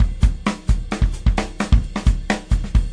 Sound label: 136 bpm, natural turnaround, drum loops and breaks